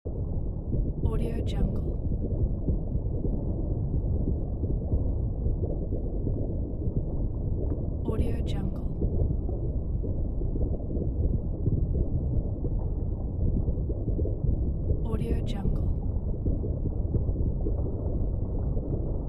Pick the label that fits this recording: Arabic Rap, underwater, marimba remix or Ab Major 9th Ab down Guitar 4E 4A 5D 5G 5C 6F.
underwater